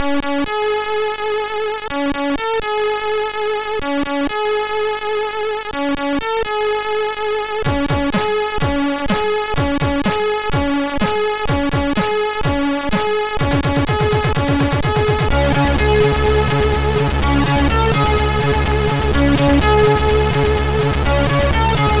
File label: Hymne of C64, MOS6581R3